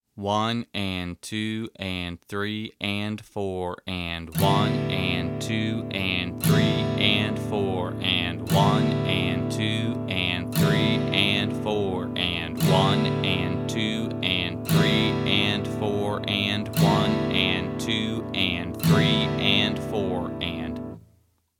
Strumming

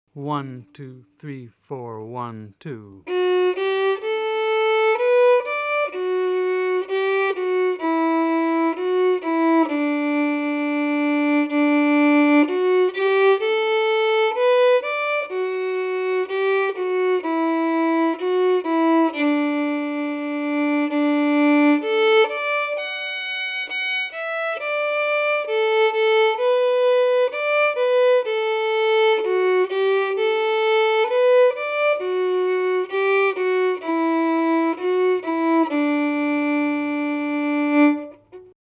Fast; Wildwood Flower

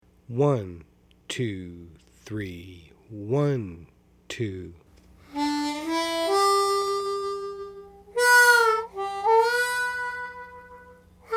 Slow; Amazing Grace

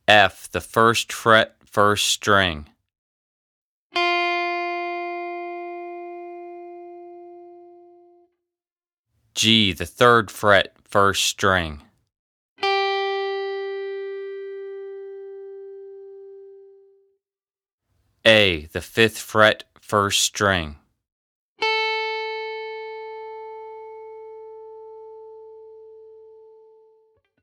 Notes on 1st String

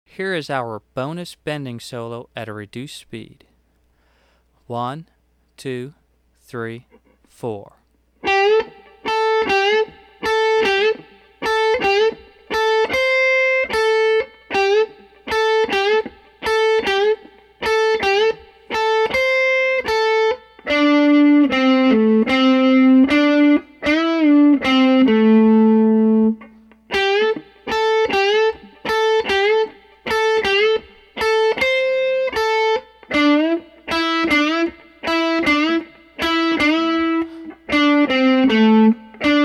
Bonus Bending Solo Slow

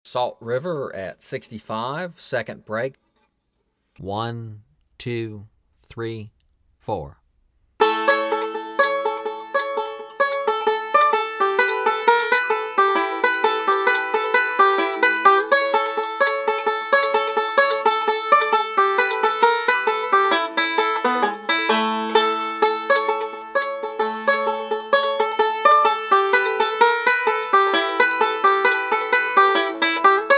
Break @ 65 Bpm

